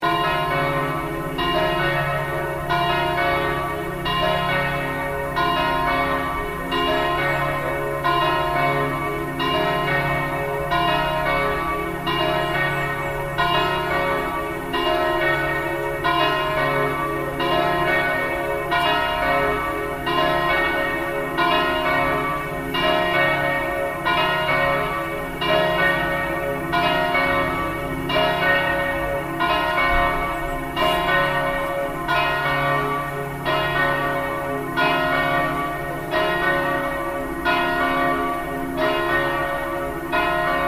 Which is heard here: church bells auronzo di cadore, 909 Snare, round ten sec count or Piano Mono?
church bells auronzo di cadore